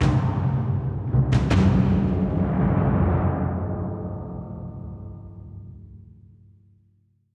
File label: Death DRUMS; dead in time